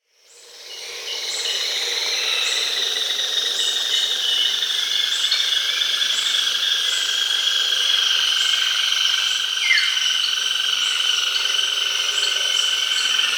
Plain-brown Woodcreeper (Dendrocincla fuliginosa), Peru; S. America